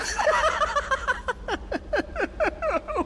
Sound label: laugh cry